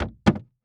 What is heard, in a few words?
Foley Footstep Hard Sole Dress Shoe Jump On Wood Platform; Foley Footsteps